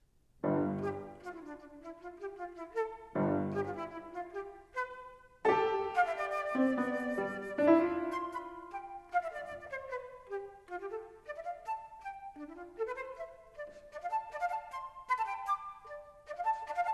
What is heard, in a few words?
Sonata For Flute and Piano - Mvt. 3. Allegretto gusto